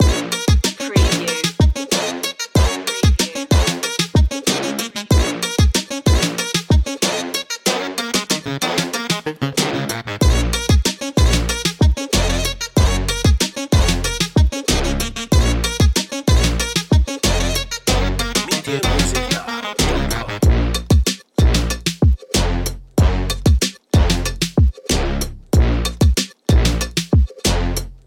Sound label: bouncy whip loop